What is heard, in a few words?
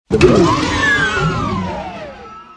manhole, effect